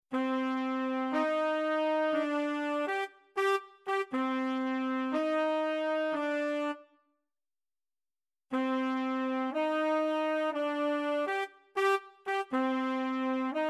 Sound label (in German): ohne legato mit legato